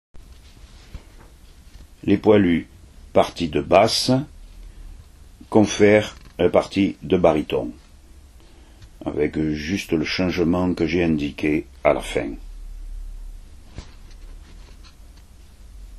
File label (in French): Basse; Les Poilus